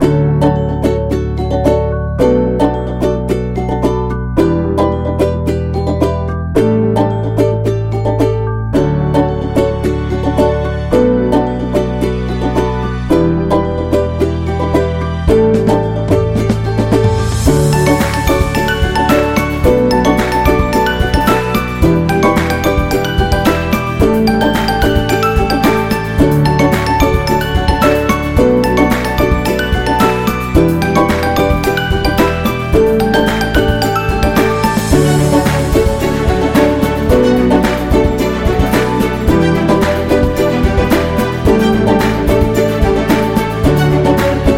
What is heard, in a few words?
Happy Acoustic